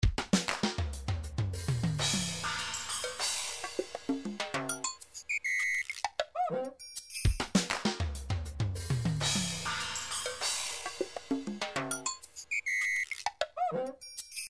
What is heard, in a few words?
133 djembe, midi; Soweto